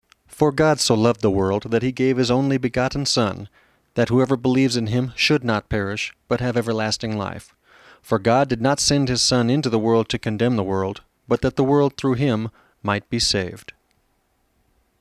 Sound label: music english